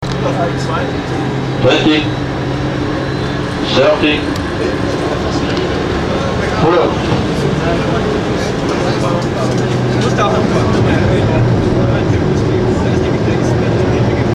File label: pull out